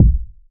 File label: urban kick b key, kick drum single shot tuned to the B note. Loudest frequency: 104Hz